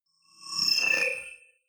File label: flyby splutter